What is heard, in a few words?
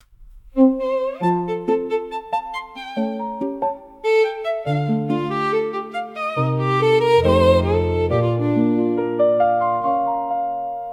rap vows